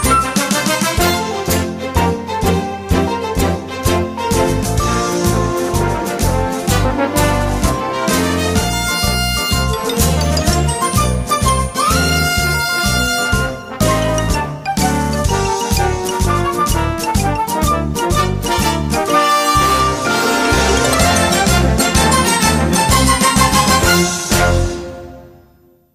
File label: backing; aud cut